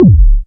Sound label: dry perc, Quasimidi Rave-o-lution 309